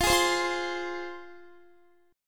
F Minor F down Guitar; strummed; tuning 4E,4A,5D,5G,5C,6F

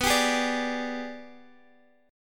G sharp Minor Major 7th Flat 5th B

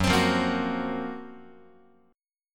F sharp Major Sharp 11th F sharp, Guitar; F#M#11 Chord; strummed; x,2,3,3,2,5

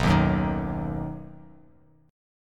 B Major, Bass Standard; strummed; JGuitar

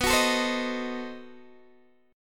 B 7th Flat 9th, down, Guitar, Open G

